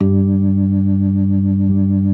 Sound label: B3 G 3; Best Service; Hyper Dance